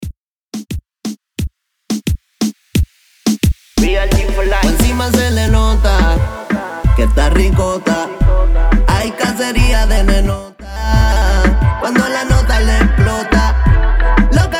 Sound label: intro outro 88 bpm